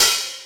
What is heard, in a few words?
Hat open; Drums